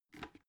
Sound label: bucket empty handle grab